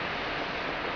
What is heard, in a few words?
smoke burn; SpecOps2 MPlayer Demo